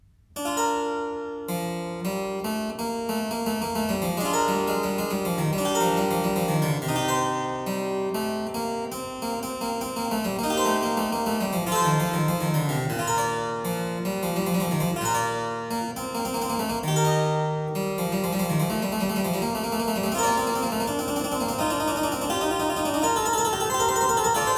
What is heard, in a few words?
Preludium (harpsichord); Byrd